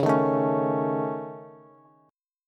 C sharp Suspended 2nd Sharp 5th C sharp Bass; C#sus2#5; strummed; JGuitar

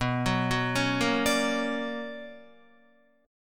B Minor Major 9th, Guitar; BmM9 arpeggiated; JGuitar

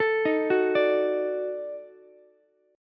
Eb Minor Major 7th Flat 5th Eb down Ukulele; JGuitar

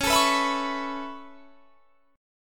C sharp Minor 7th Sharp 5th C sharp; C#m7#5 strummed

